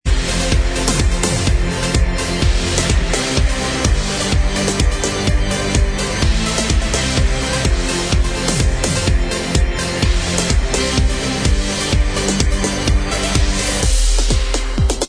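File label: instrumental, backing track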